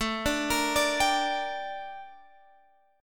G Minor A; arpeggiated; Gm/A

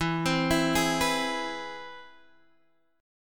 C Augmented Major 7th E

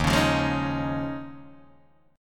Eb 9th Suspended 4th Eb, Guitar DADGAD; Eb9sus4; strummed; 1,4,3,1,4,1; JGuitar

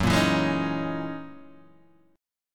F sharp 13th F sharp down Guitar DADGAD

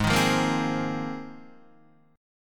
G sharp 6th Add 9th G sharp Guitar DADGAD

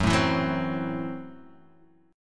F sharp Minor 7th Flat 5th, Guitar Half Step Down

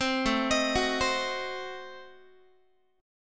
A Diminished 7th A down Guitar; arpeggiated; Adim7; 15,17,18,16,16,x

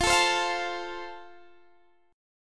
E sharp Major E sharp, chord; strummed

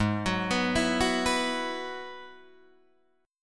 Ab Minor 7th Sharp 5th Ab, down, Guitar, DADGAD

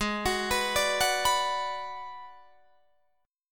Ab Minor 7th Flat 5th Ab, down, Guitar, Drop D

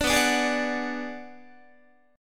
B Minor 6th B, Guitar; Bm6 strummed; JGuitar

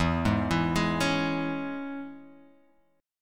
E 6th, down, Guitar, Half Step Down

